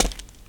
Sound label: Foot Step